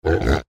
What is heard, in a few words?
pig oink